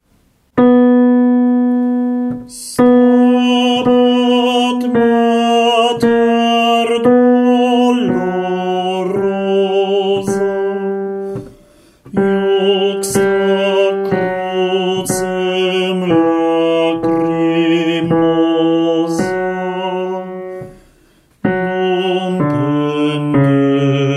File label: Stabat Mater BAS